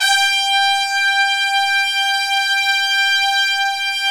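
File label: trumpets section; Roland L-CD702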